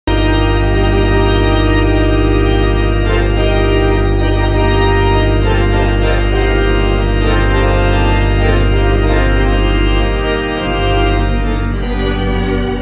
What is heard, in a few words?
organ instrument